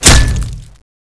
cbar hit bod; z-lapik ru

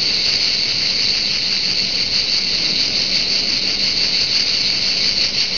persia crickets

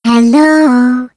turret autosearch

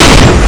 sniper shoot; z-lapik ru

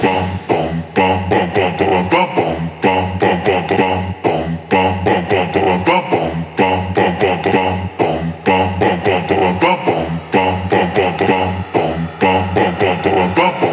boom

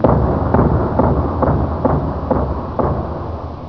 ghost knocking; farmoween

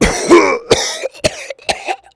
cough; z-lapik ru

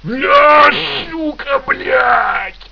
die; z-lapik ru

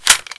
reload, weapons; z-lapik ru

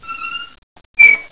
swing

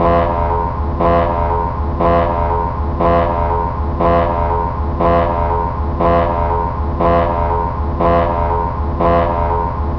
big warning; outland underground

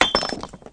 grenade hit

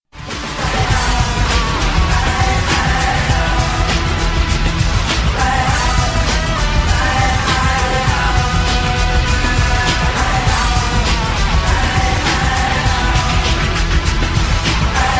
win music

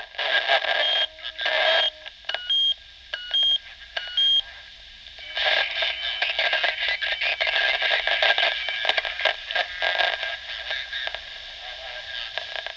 radio; dutch

